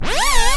SYNTH SCRAT, Vinyl Scratches

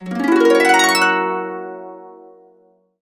Complete Harps; USB Soundscan vol.62; AKAI

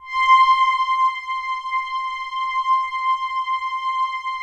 OB LOW

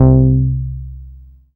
BAS MKS-50, Roland

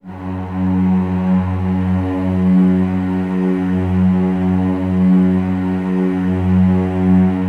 F#1, Celli; Best Service ProSamples vol.51; Classic Orchestra 2

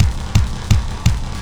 LOOP, Eurotech; Sounds Good

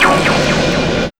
SYN-FX

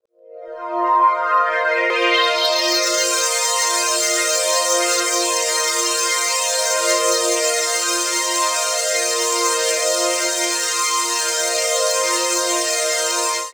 Pad String sound, Drum & Bass Pads; Sonic Foundry (Sony Creative Software); Knutrix Drum & Bass

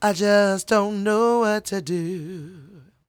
croon, Vocal Planet Blues Soul; Spectrasonics

